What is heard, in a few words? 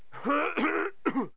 cough; quake